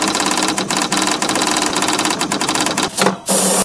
equip, ambient tones; Source Engine; cstrike

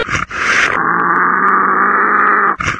zombine charge; npc; source engine; episodic

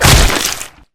zombie slice; hit

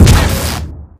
ion cannon shot, energy; video games; ambient; source engine; portal2

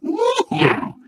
ballbot impressive

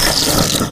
player enter stick paint

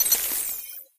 orange panel collapse; ui

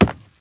wood, footsteps, infected, walk; player; source engine; left 4 dead 2

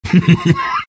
sniper laugh happy

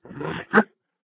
ballbot agreement

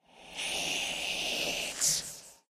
sword idle

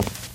walk tile, footsteps

